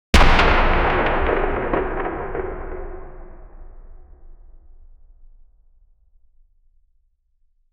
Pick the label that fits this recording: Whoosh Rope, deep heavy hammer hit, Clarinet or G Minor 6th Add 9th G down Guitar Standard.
deep heavy hammer hit